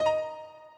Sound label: pause continue click